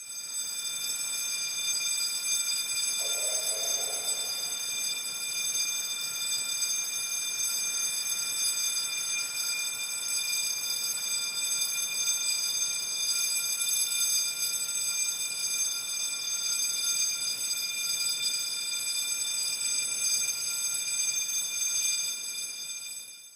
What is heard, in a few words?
bank alarm